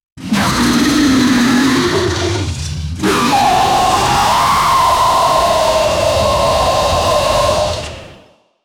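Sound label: hybrid dinosaur combat roar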